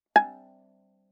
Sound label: Viola pizz ff sul A Ab5; MIS Pitches - 2014; Strings